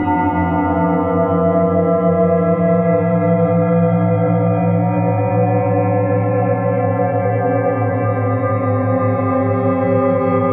Glassy Moan Amb; Warframe; Orokin; Ambience; Interior

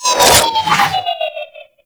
Grenade Proj By; Prime Grineer Trapper; Warframe; Tau

